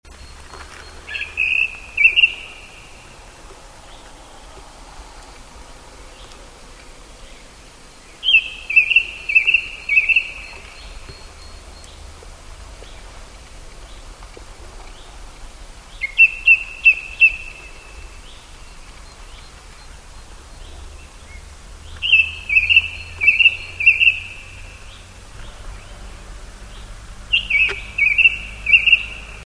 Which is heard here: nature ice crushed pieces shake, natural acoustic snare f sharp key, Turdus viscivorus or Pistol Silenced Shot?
Turdus viscivorus